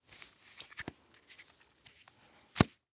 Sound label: ski goggles put on; prosoundfx